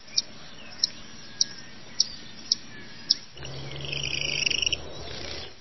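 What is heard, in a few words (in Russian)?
посвистывание и пение трелью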